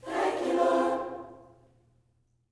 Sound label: THANK U, Gospel